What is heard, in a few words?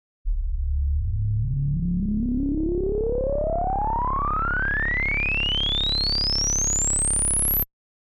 Ladder Reso; synthmaster